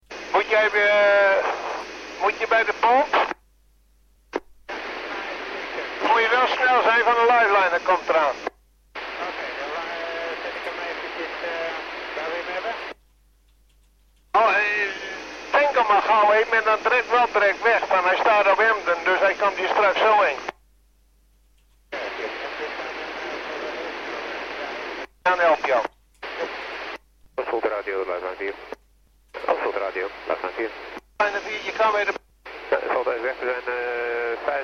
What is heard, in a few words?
lifeliner; vanaf emden naar oostwold airfield